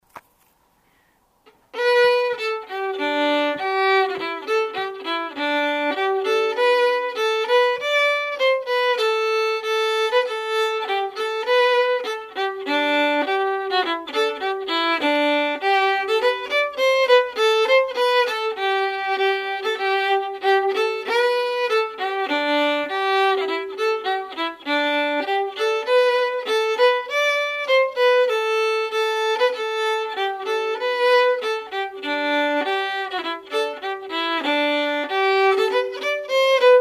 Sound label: acc and fiddle club